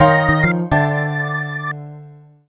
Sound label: Jazzy short; 9210 CD